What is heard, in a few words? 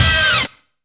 SAXY STAB; Zero-G; Funky Elements